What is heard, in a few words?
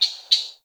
Tree Birch Bird; Trees